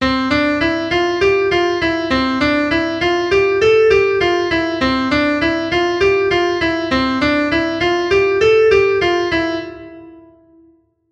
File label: Pattern C to Dm